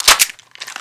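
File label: weapon foley mg4 reload open; Call of Duty Modern Warfare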